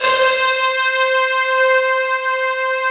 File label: BREA PAD C5, BREATH SYN; Synth